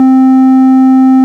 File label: ORG VIP Cl 4'C 6, Farfisa Clar; Farfisa Clar DBar